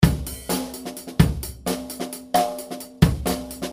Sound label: 130bpm with ghostnotes; 130bpm not so basic